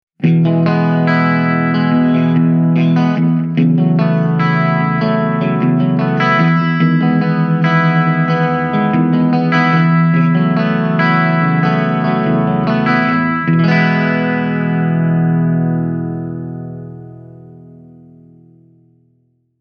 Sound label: Mooer Hornet Black clean